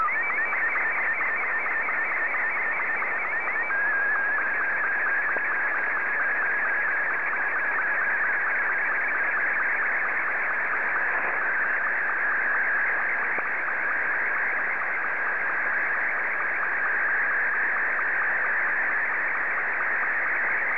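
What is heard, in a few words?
SSTV, Martin 1; ZL2TJM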